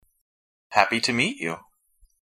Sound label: happy